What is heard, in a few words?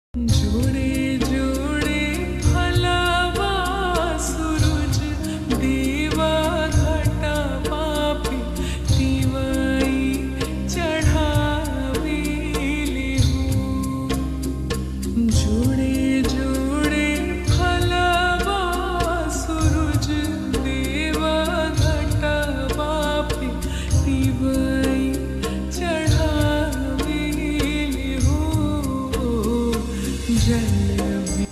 Slow Reverb Version Ringtone